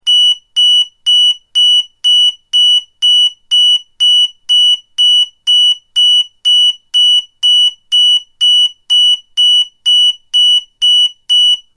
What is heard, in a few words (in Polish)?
ton